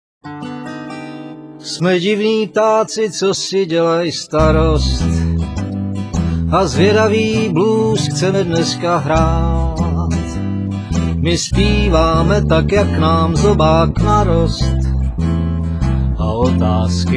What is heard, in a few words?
blues